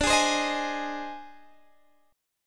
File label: Ab Major, D, guitar chord; strummed; Ab/D; JGuitar